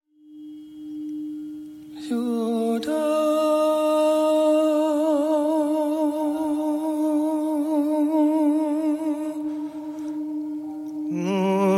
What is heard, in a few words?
Heart Sound Meditation; Hathor